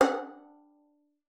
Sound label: FING F#5, VIOLIN; Xsample VOL-5